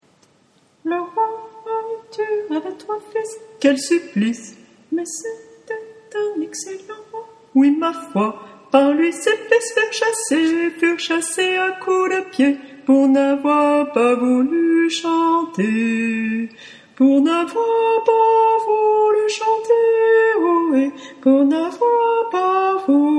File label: basses; Le roi Arthur